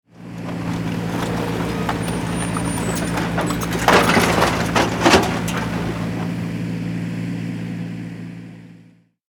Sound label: Excavator construction vehicle bucket dump